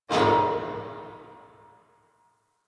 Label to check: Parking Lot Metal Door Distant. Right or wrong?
Right